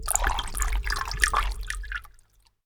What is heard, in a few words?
Liquid